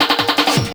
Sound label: Fill, Drum N Bass Percussion Fills n Breaks; Sonic Foundry (Sony Creative Software); Knutrix Drum & Bass